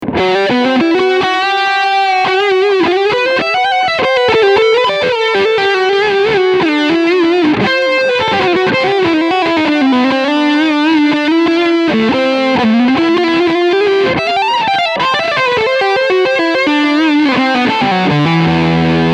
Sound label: Soldano Emulation